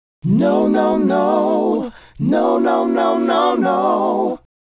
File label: Male Group, Hip-Hop and RnB Vocals